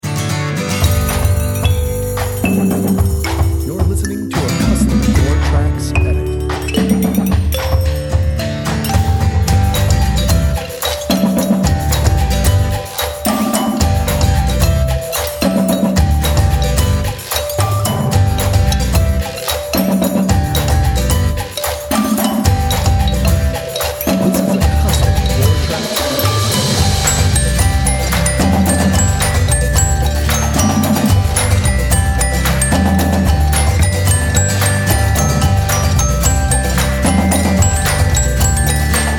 happy dance

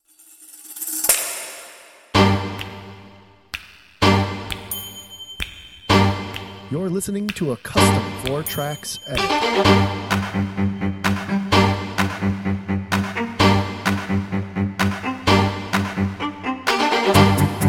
with tambourine

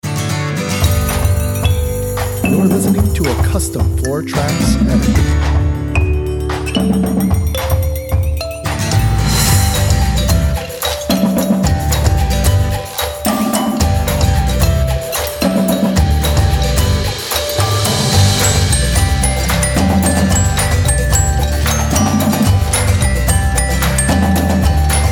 happy dance

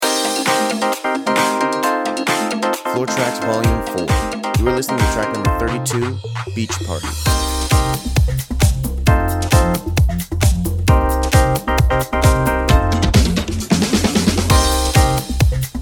beach party

